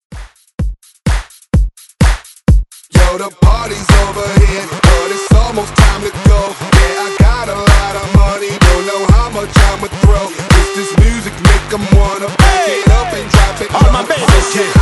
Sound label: Re Drum 126Bpm